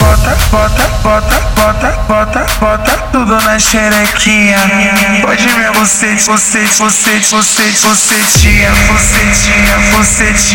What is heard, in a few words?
Phonk Music; فانک phonk